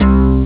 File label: hard bass; navy; Amiga Soundtracker Sample Packs